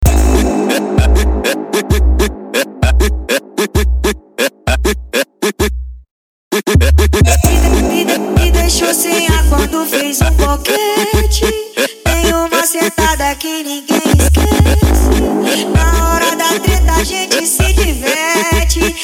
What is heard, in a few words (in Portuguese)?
funk mega bass e noia dance